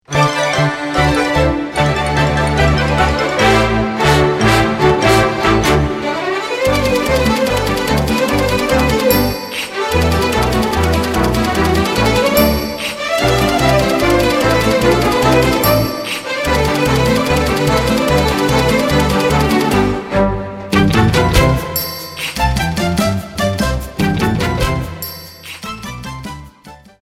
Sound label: Typewriter song